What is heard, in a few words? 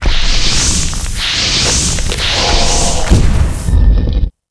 Mob die; Monster; 패왕릉; 호국투창도용